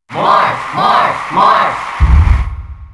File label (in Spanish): Grito del público; Marth; SSBM